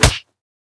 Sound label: Hit; smite; god susano